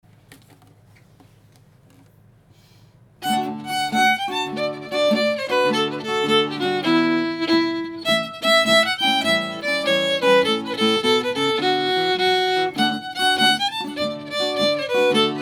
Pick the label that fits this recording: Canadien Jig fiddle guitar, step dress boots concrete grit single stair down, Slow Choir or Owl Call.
Canadien Jig fiddle guitar